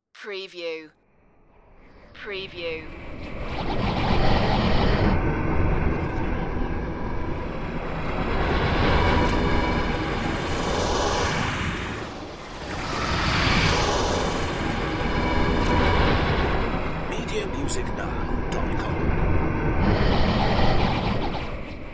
time flutter swooshes

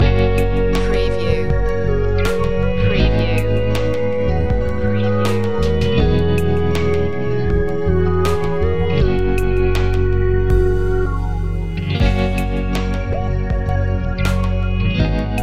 ambient dream loop

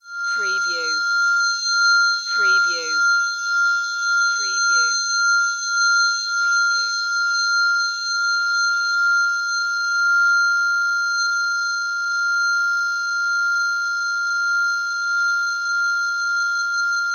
Laser Scope High Pitch; Sound Effects Bible

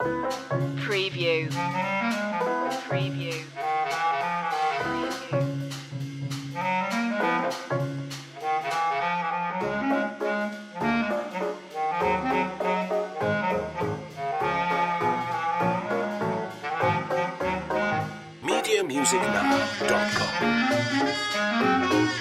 bugsy bop loop

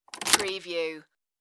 car key insert and pull

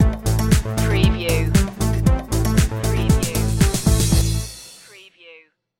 technology beat stinger

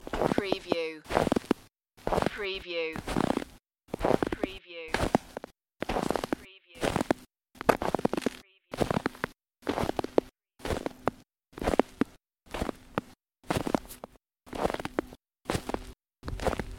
Snow and Ice, Footsteps snow

